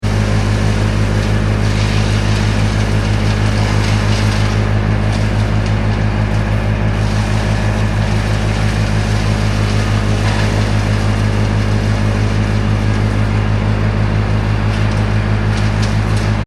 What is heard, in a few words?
industrial machine wood chipper vermeer bc150; Wood Chipper Operating ( Vermeer Bc150 ); Machinery; Machines; Wood Chipper Operates; McKinney Sound